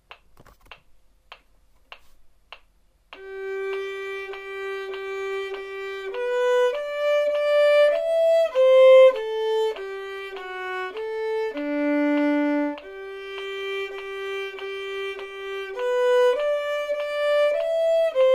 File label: vln prac; Building Technique with Beautiful Music, Book 1 Violin; applebaum